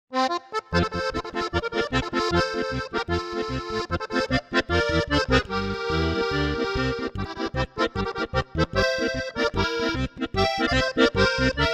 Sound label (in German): Oberkrainer